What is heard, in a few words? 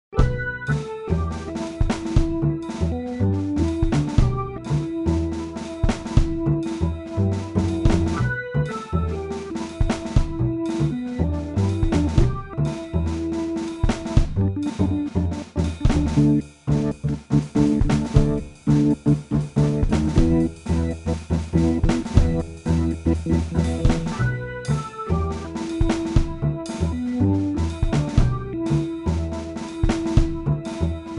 solo Instrumentals Quick Jive